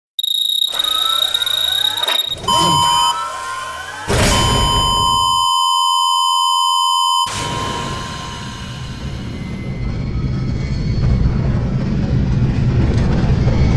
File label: doors open